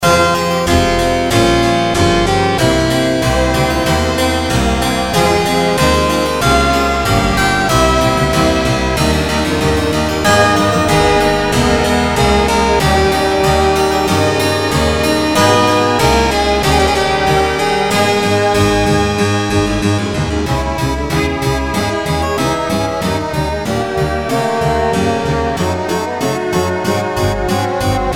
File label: Clavier